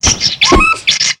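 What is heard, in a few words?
Npc Fly bat Attack; FlyForInsanity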